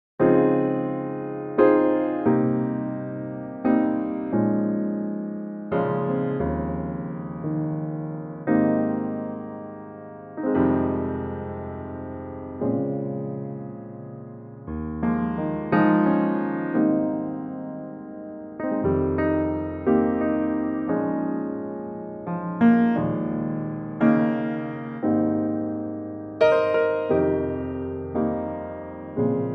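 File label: Ab Piano track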